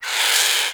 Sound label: Avichaea Tranq Dart Grunt; Hunting Critters